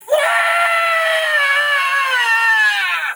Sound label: screams